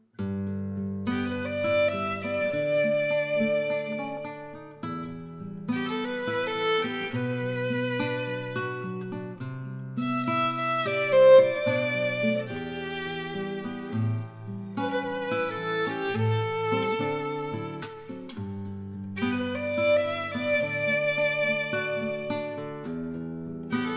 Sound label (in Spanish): canción La Rosa interpretada por guitarra y violin